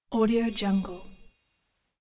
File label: magic; fx-market